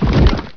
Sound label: CHUTE; Dkedemo